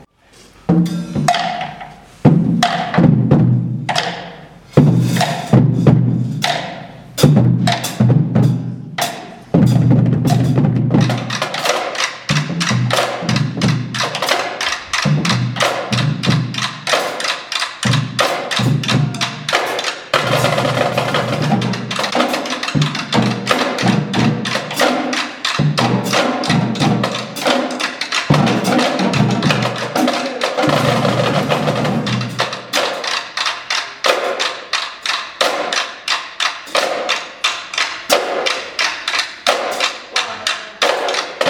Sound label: Rock Groove